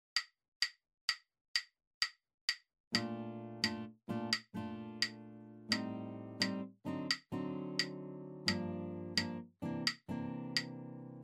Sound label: bass in 3 playalong